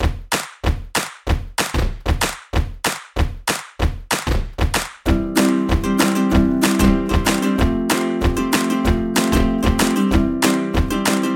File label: Nerve Beats